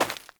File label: Grunt Step Default, footsteps; Enemy; Corrupted Sproutfolk